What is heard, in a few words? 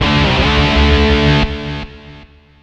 Pickup Guitar Riff; Pickups